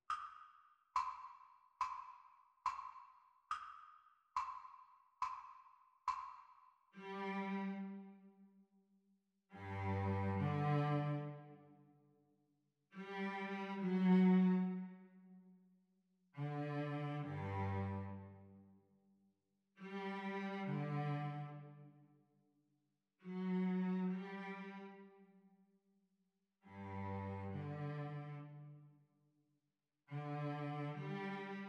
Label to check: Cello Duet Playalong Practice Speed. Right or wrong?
Right